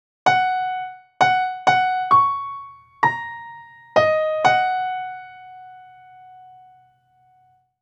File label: melody aum govindaya ringtone